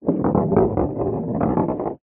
sport pool ball roll inside table after being potted